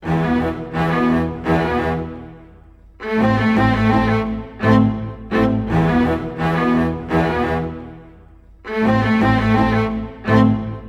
85 violas+cellos+bass, Orchestral arrangements, 85 E min